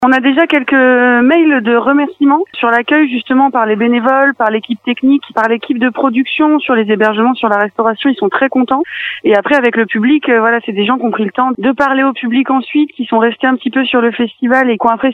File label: brass festival